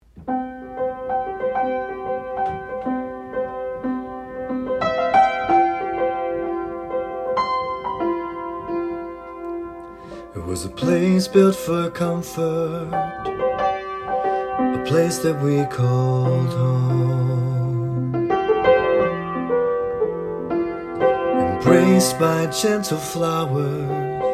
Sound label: acoustic piano